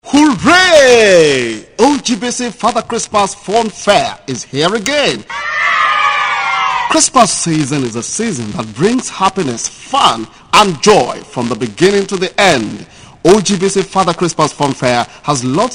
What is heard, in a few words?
Father Xmas jingle